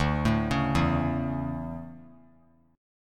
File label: D Major 7th Suspended 2nd; arpeggiated; JGuitar